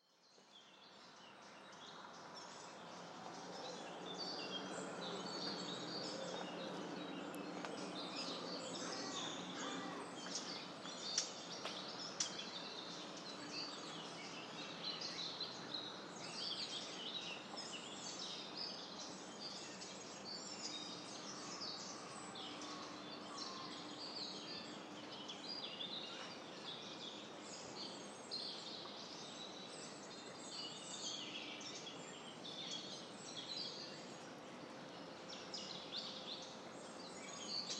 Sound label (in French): oiseaux vent; Oiselay